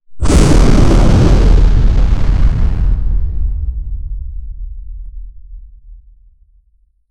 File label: roar massive, smoky; grillquake